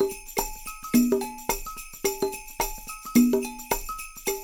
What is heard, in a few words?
Percussion, 108; Sampleheads; NYC Percussionworks; 90 s Sample CDs